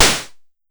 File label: Snare, Pollard Syndrum